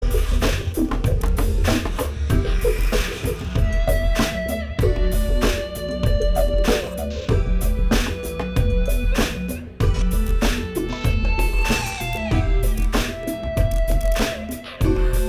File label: Improv Jam